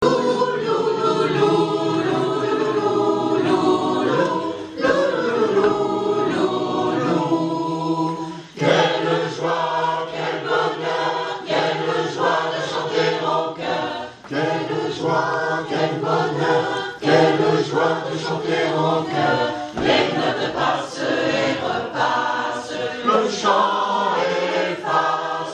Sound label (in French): sonnerie entier avec intro tous